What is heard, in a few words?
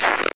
player snow